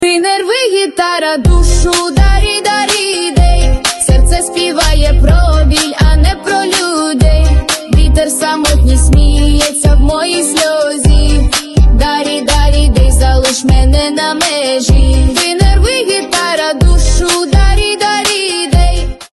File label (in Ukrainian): gitara; Гітара; Українська музика на дзвінок